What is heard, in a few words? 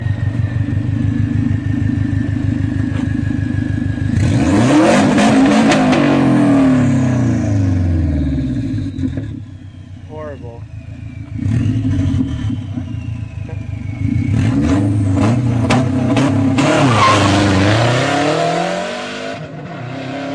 Straight Pipe; Sti